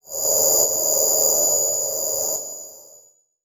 Transition Digital Distortion; Production Element Title Transition

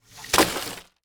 Impact Wood Debris Falling Onto Wood

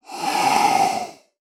Animal Bird Vulture Hiss; animals; AdobeCC

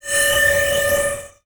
Transition Feedback Sweep; Production Element

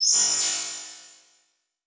Multimedia Internet CD-Rom Flash Hi-Tech

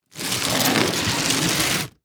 Foley Treasure Chest Slide On Cement

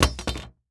Industry Machete Dropping On Hollow Wood

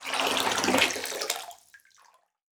Liquid Water Object Removed From Bath Tub

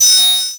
Multimedia Internet CD-Rom Flash Wipe Electronic

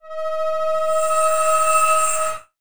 Transition Musical Tone Drone; Production Element; Title Transition; AdobeCC

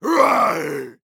Human Male Roar Monster Roar

